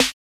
Hip Hop Snare

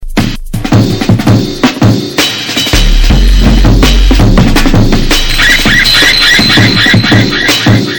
Hardcore Junglist

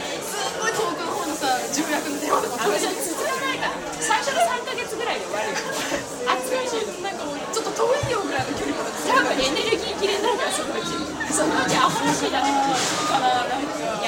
Japanese Cafe Audio

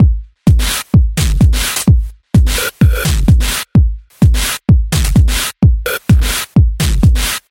Hard Distorted House

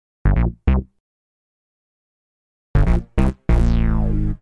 HipHop Bass

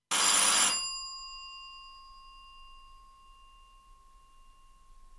Old Doorbell Sound

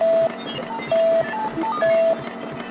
Computer Sounds

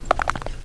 Bones Crackling

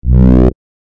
Bass Hit

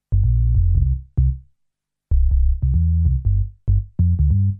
Hip Hop Sub Bass

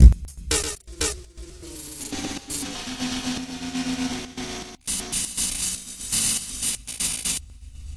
Granular Drum Loop

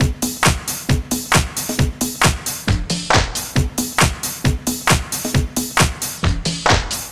House Pitch Wheel

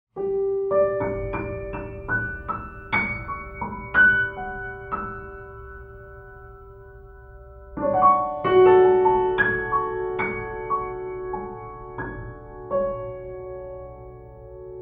Grand Piano Mello

